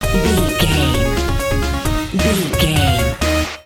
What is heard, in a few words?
big boss battle video game stinger